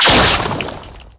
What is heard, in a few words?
poison projectile